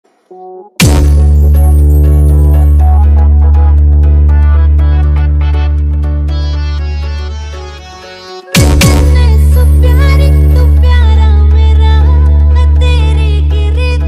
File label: Dhol Dance Remix